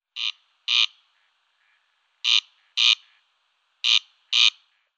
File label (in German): Wachtelkoenig Crex crex; Vogelstimmen